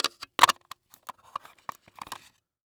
Technology Electronic Camcorder Panasonic Dvx100 Placing The Lens Cap On